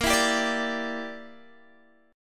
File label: Bb 6th Flat 5th Bb, Guitar Open G